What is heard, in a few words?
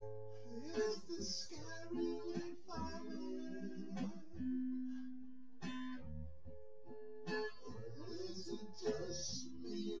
test nodrums synthconv 250ms 1m src; SiSEC08; SiSEC underdetermined